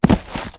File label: body land